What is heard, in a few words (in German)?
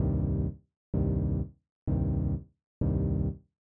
subtraktive synthese cutoff; Subtraktive Synthese mit Bitwig Studio – Tutorial